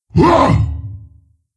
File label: Wound, Creature; Steelbreaker